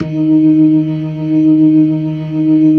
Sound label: LO MAN V L, ORGAN LOWER MANUAL; Keith Emerson Signature Series